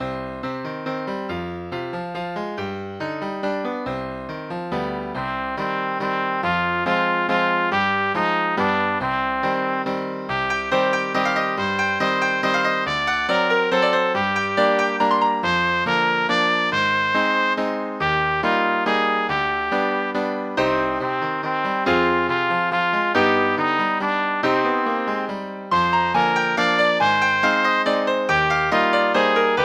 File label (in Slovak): pre trúbku a klavír